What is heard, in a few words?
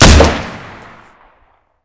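crossbow fire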